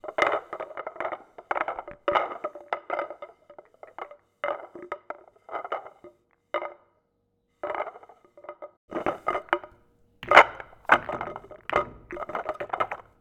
Pool Balls Being Shifted In Wooden Triangle On Pool Table